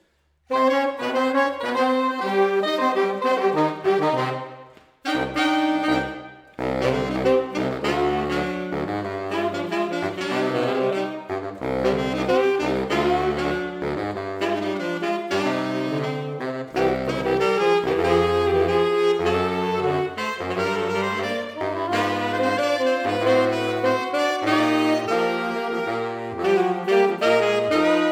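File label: Rumba, saxophone quartet; Jazz; U. Leuenhagen; Lilienthaler Saxophon-Quartett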